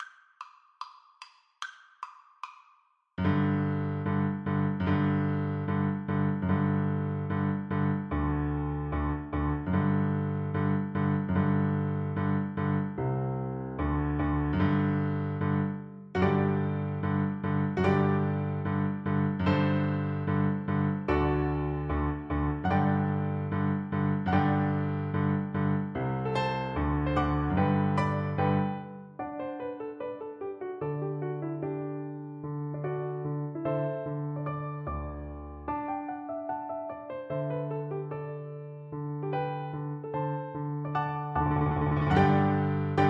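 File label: recorder karaoke; black bear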